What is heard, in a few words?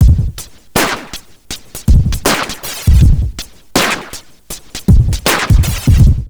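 neuro, drum loops crazy processed, 80 bpm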